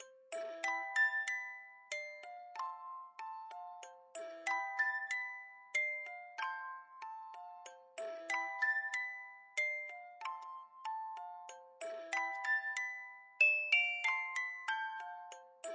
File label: orgel; bgm